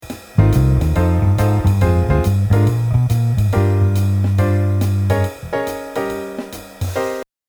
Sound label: E♭, bass